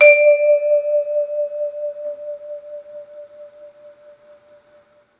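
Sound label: tubular bell; 3D Sound; 3D Keys VOL-1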